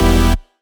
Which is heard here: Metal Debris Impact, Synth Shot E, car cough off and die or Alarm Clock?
Synth Shot E